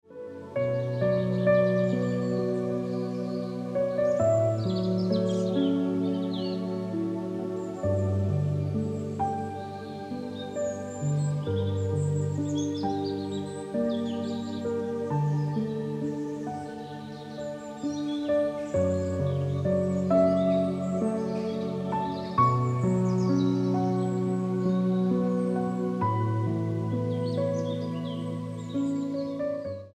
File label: Lofty Hills Ringtone Piano Music